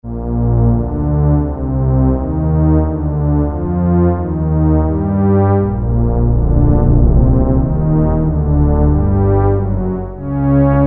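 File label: Double Basses, Roland Alpha Juno-2; Factory B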